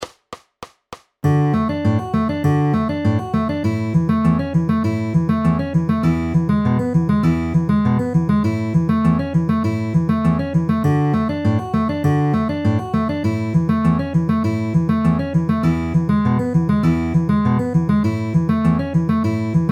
D A E A pinch ring middle with alternating bass at 200 bpm